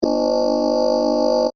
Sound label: Scanner Mechanical, Scifi, Motor, Whine; Tech; BlastWave